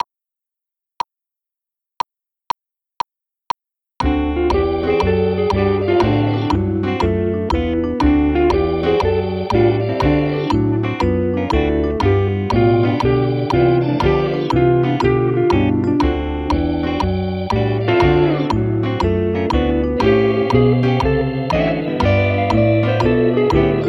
Guitar

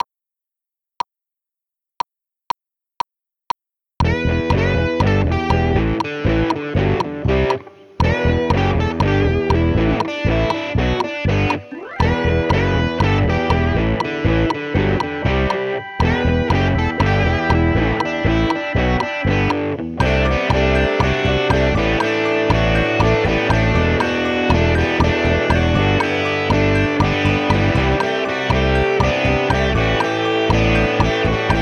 Guitar

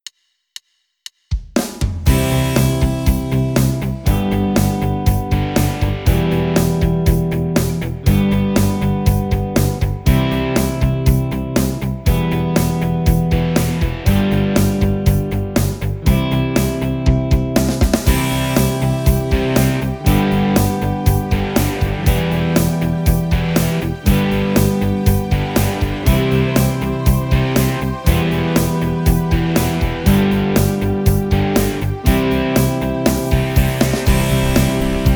Exercise 1 Open Strings; Guitar 1

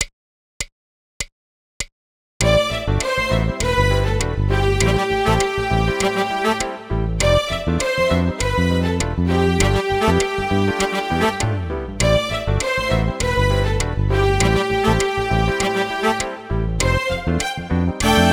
Funk Prep Vintage Vibe

